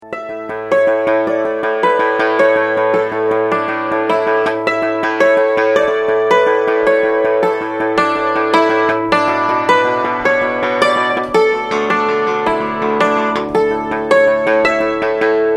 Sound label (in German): glockenton